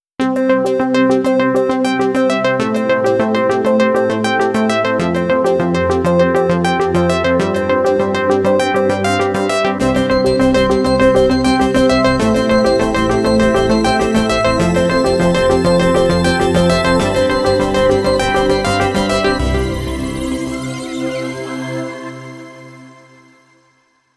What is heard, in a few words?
music